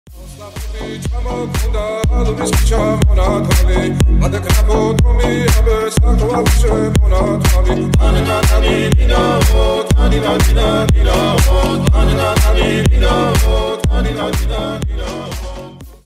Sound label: georgian edm trap music